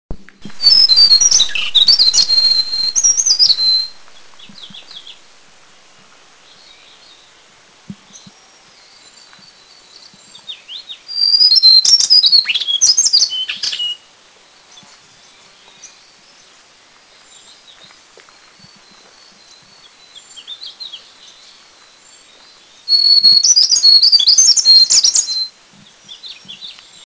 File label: White-browed Shortwing, Eaglenest, India